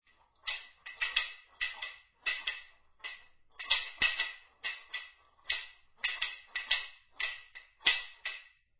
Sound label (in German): trichtrach